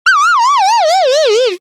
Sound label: 8 Bit Game Lose